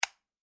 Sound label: household switch light flick; Light Switch ( Wall Mounted ) Single Click; McKinney Sound